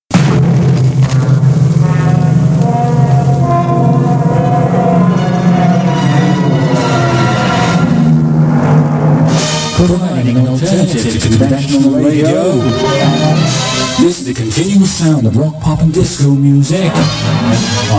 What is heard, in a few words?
Jingles - Rock Pop Disco; MAR Jingles; Original MAR Jingles